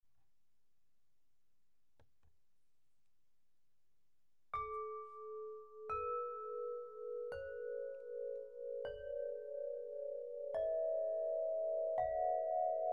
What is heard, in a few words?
Heavenly Sun A 432hz, Crystal Harp; Crystal-Harp-Ethereal-Wings; Harfa krysztalowa Niebianskie Slonce A